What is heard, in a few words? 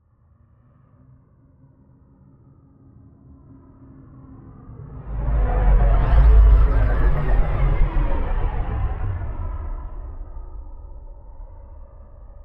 capital Ship; Maidens